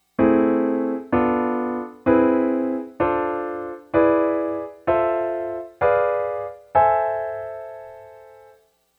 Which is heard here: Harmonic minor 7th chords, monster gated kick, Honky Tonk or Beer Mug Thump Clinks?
Harmonic minor 7th chords